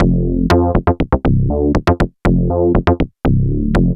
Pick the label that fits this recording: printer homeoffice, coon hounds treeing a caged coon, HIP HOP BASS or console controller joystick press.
HIP HOP BASS